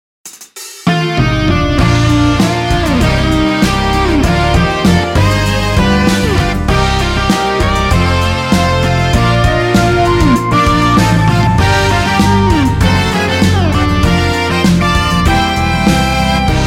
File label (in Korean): MR